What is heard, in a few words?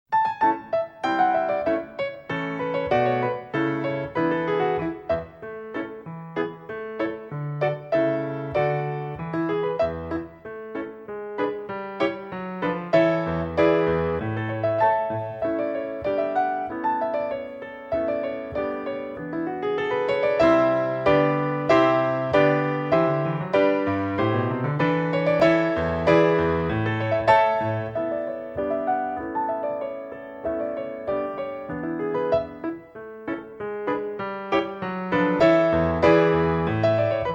Gloucester Moors Accompaniment